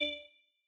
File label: Mouseover; Rain Talisman